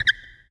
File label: Basketball Squeak